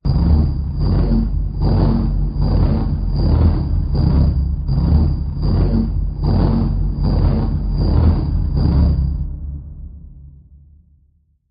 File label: Windmill; Shadow Woods